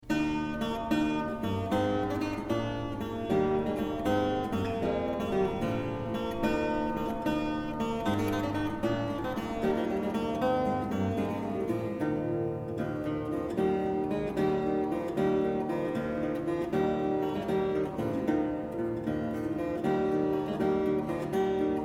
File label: bandora; grimstock